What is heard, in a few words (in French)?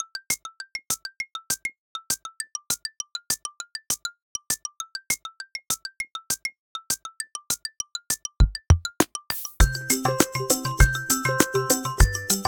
Ballet des serviettes instrumental; jeux de bain